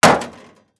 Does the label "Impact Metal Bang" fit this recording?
Yes